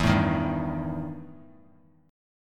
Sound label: E Minor Major 7th Double Flat 5th E; strummed; JGuitar